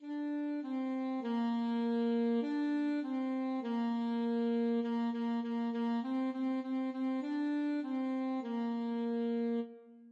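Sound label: alto saxophone; hot cross buns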